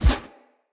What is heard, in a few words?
Foley Armour Step; Characters; Enemy; Mockeries; SubBoss; Mockery